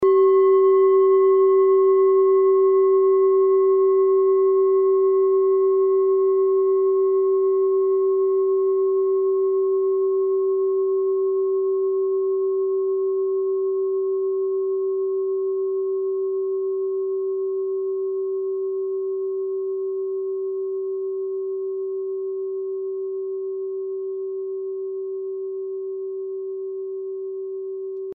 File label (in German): kleine klangschale